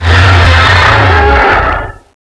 roar; gearbox; renesaur

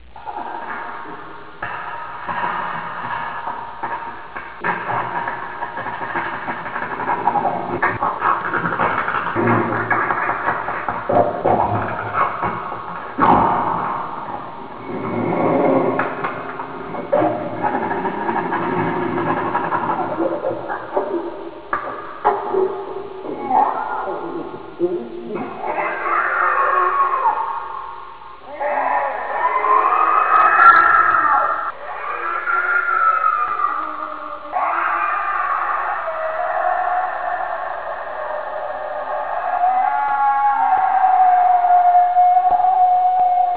big shadow sounds; svencoop; aompa

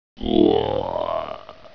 die; svencoop; portal house; aberration

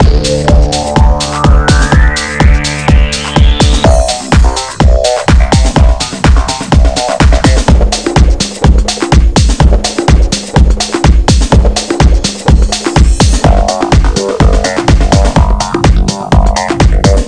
music track

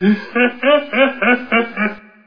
hehe; dmc

